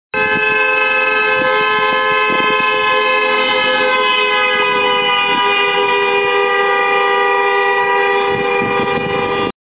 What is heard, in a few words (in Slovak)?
auto trubenie